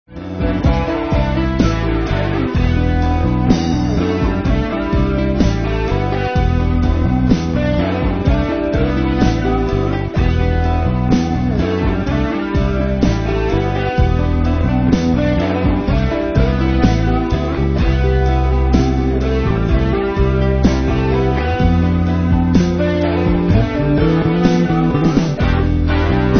Etheric Ballad, moods

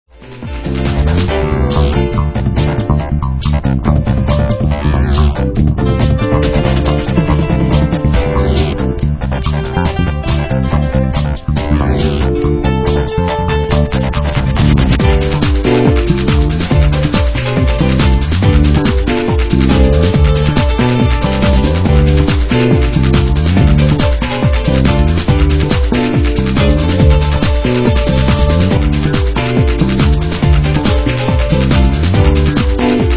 Gajka, instrumental

